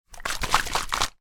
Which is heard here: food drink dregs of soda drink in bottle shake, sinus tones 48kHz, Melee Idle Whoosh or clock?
food drink dregs of soda drink in bottle shake